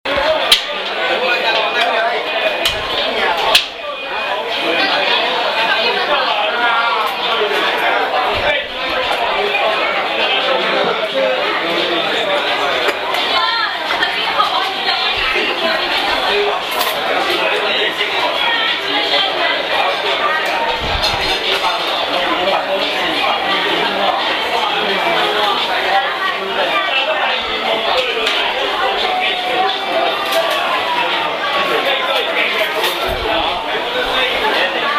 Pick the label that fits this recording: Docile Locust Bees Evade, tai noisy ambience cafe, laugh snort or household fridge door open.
tai noisy ambience cafe